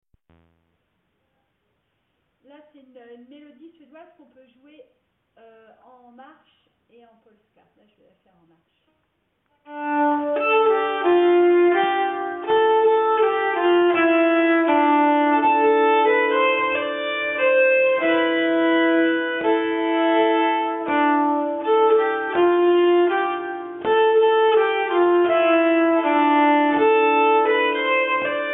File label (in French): Melodie Suedoise Marche, nyckelharpa